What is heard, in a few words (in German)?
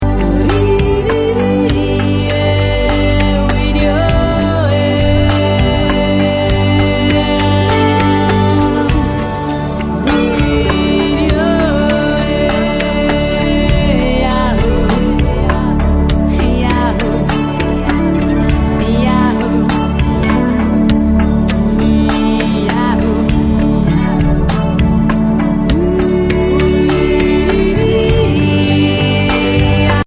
jodler